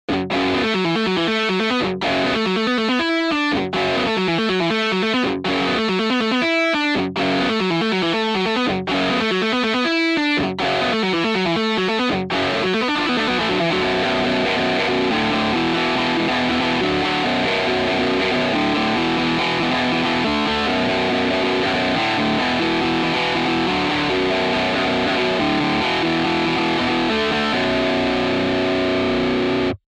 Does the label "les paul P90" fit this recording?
Yes